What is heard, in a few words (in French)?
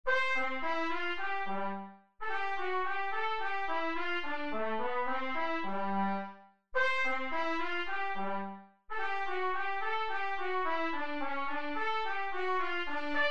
danse espagnole; moszkowski moritz